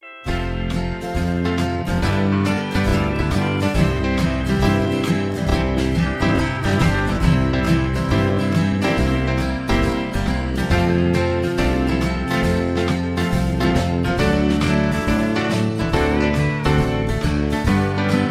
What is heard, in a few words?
Low Key